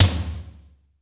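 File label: tom, funk kits; Crypton - DrumBase5000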